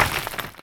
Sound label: dirt